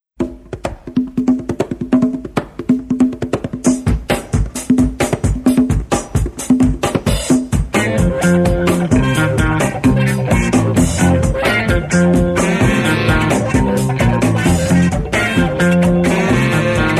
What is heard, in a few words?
hardgroove